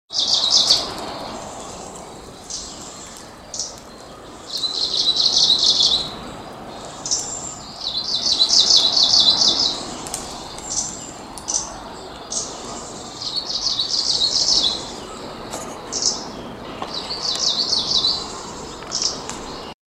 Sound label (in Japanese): oomushikui